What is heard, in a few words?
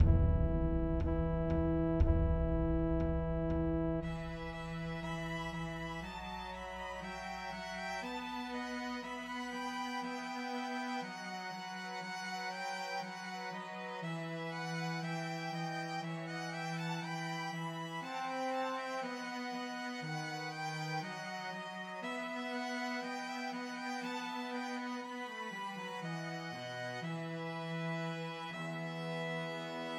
Christmas Trios for Two Violins and Cello; Pat a Pan